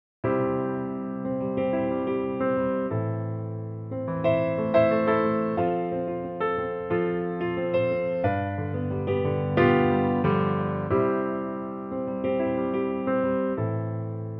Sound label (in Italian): Giro di DO, basso elettrico